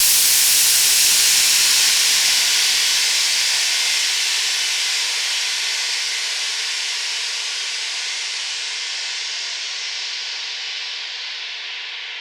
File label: Down Lifter